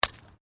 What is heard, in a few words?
Digging Small Handheld Tool Rocks